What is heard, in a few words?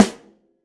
Snare Stick Hit Hard Dry Session, drums